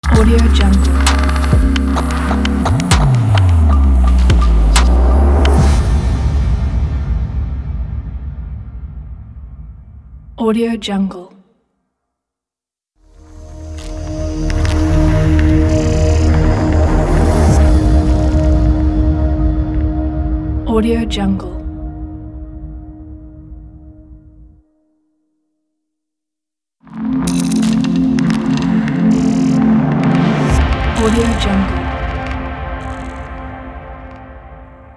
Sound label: future logo pack